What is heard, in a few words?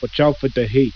zombie idle